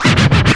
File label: Vinyl FX and Scratches, FX Scratch